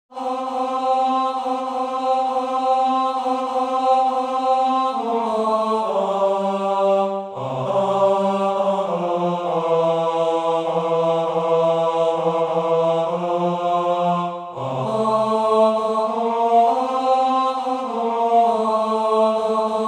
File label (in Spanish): Venid Pastorcitos tenor